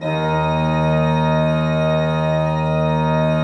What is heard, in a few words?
PLENO D2, Cathedral Organ; ILIO; Propeller Islands